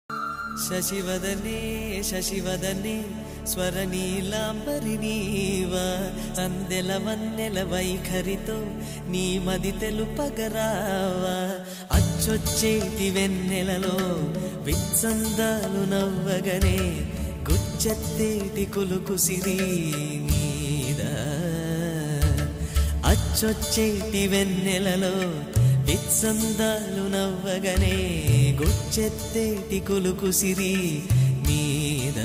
telugu song

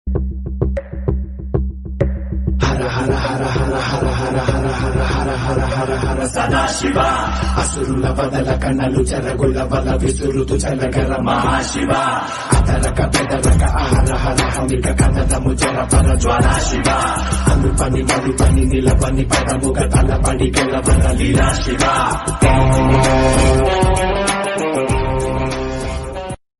mass bgm ringtone; shivalinga; south

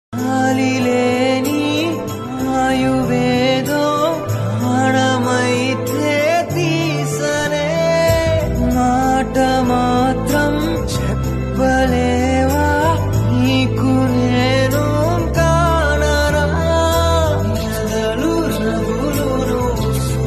telugu love songs; love failure

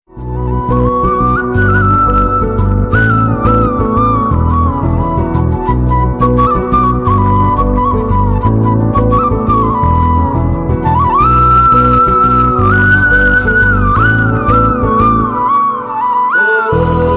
movie bgm ringtone; south

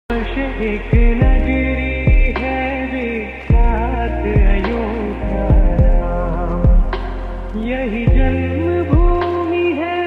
bhajan ringtone; lofi; krishna bhajan

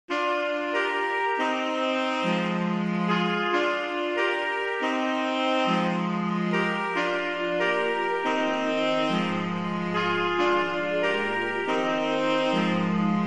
relaxing saxophone music ringtone

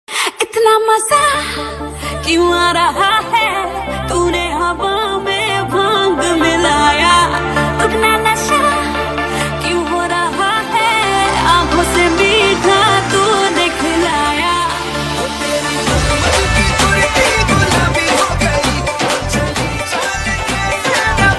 instrumental ringtone

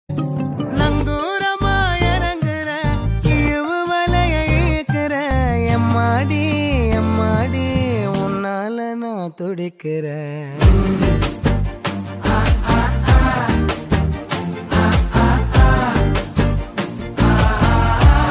love ringtone love bgm; diesel movie

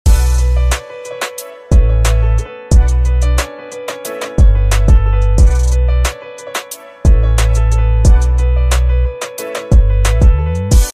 instrumental ringtone word beat

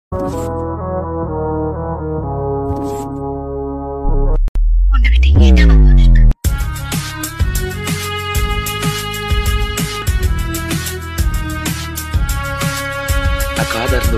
bgm tamil; ajith

